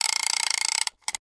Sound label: Fishing reel being reeled in at medium and fast speeds, close up.; fo fishreel med; Reel, Fishing Click, Fishing Reel Ratchet